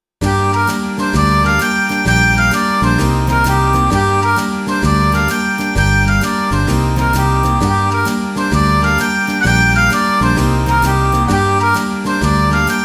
Penta in Gm 130 Country Shuffle

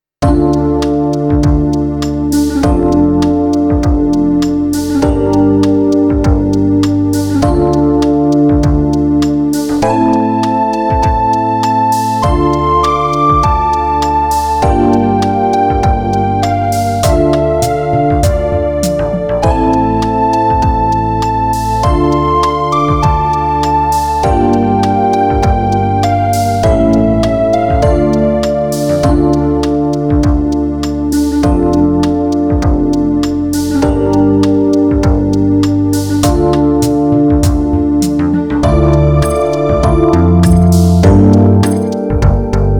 Easy Rock Synth Band

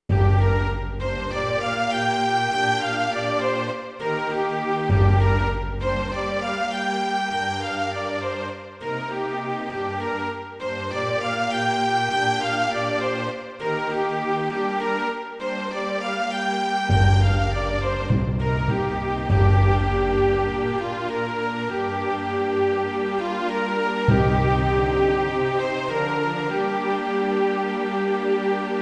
Penta in Gm 100 Small Orchestra

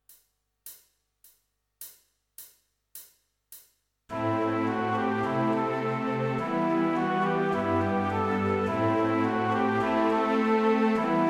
Em Riff orch. melody holzbläser 105

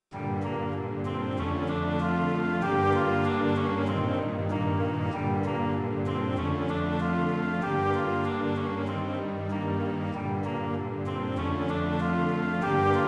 Blues Rock in Gm Chorale Orchestral Melody 96

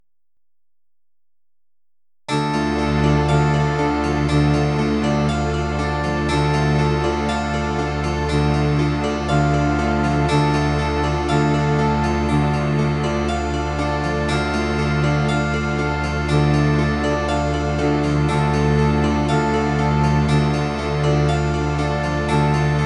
Baroque Piece in Dm w. reverb

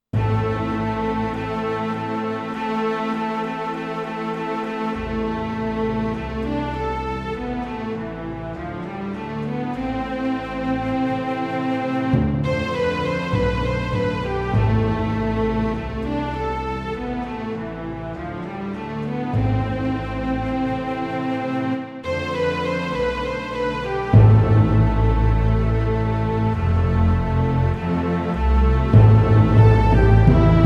small orchestra

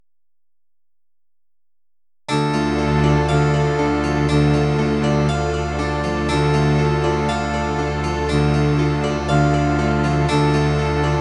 Baroque Piece in Dm

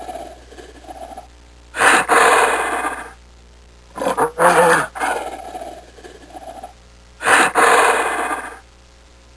gorilla

